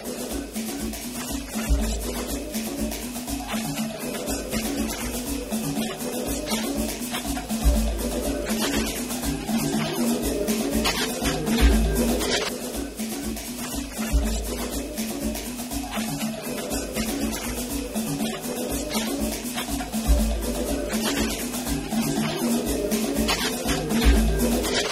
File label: Jungle Music